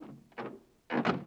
Vehicle Door Creak; Vehicles; Doors & Keys

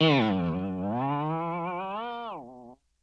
Boing Box; Vintage Cartoon; Hits & Tones

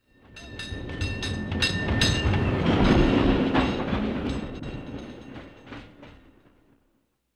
Street Car

